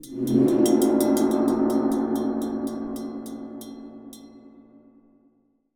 Level Start

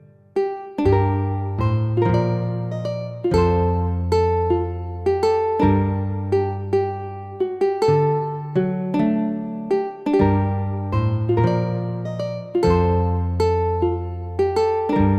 MUSIC DANCE

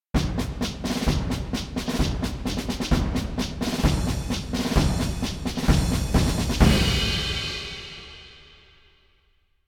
Marching Drums

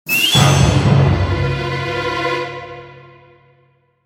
Jingle Interruption

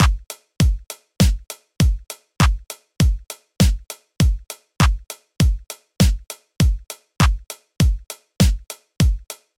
100BPM Kick; Kovox Pitch; PS4

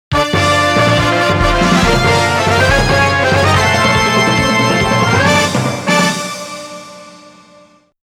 Game Start

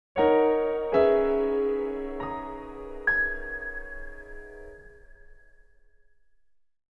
Chinatsu jingle; Memories Off Yubikiri no Kioku

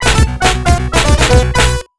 Touchdown Stinger; prime time nfl nfl 98 genesis

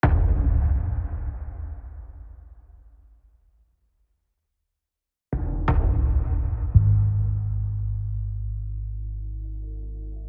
Tension Low; The Last of Us; AB Tension 5 Low Block

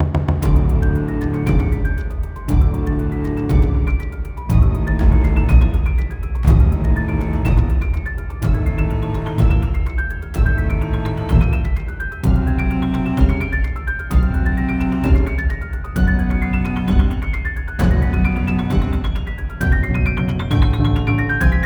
BGM Tension; the letter a horror visual novel switch gamerip 2021

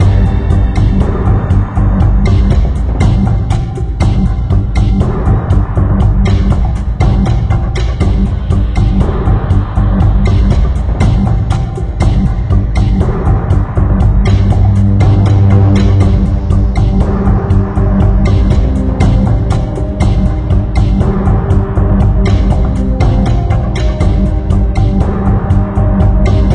Tension Loop

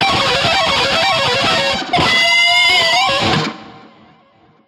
Shreds Guitar Shredding

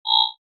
Warning Beep

